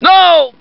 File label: hostage pain, hos pain; csco; csgo